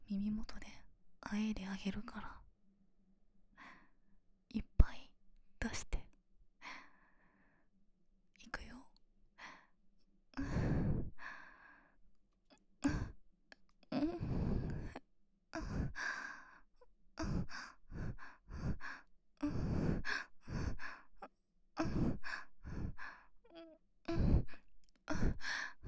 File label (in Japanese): 喘ぎ声